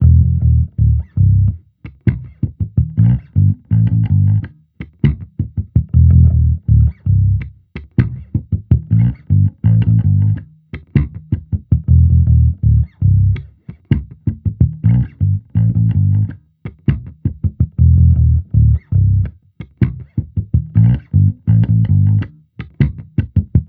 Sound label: Amped loop 81 E, Electric Bass Loops; Slate Digital BASSHOLE Sample Pack